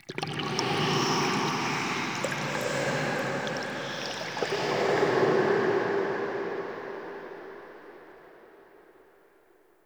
trippy breath; Ambience; Soul Plane

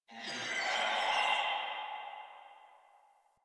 Tree Distant Critters, Ambience; Props; Warframe; Duviri

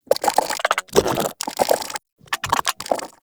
Vocal Idle, Shrimp Lantern, Creatures; Baliq

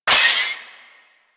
Lantern Power Up Short; Warframe; Emissary Season Two

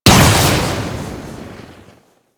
Electrical Explosion; Ambience; Warframe; Corpus Infested

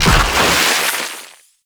Egg Mine Field Burst; Tau

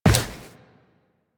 Run Default, Footsteps; Warframe; Tenno

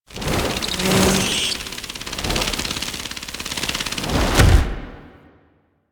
Rhino Deluxe Noble Akimbo; Warframe; Warframes; Idles Noble

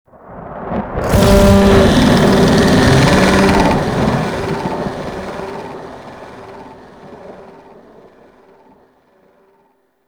Infested Micro Planet Sun Monster Bellow; Warframe

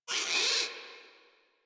forest rodent vocal angry short; Characters; Warframe

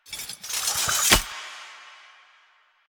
Idle Sword Twirl; Arthur; Hub; Warframe; Ambience; Nineteen Ninety Nine

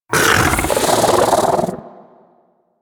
bug vocal mount; Warframe; Hunting Critters; Inf K Drive Bug

